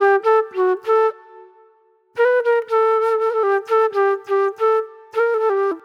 Classic Soul Flute

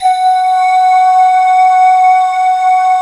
glockenflute, Glocken Bell; Optical Media International; Sonic Images Library

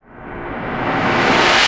NOISE, Production Effects

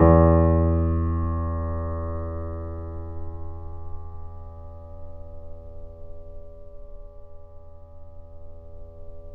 PP E 6, Grand Piano off mic; AKAI S6000 CD-ROM - Volume 2

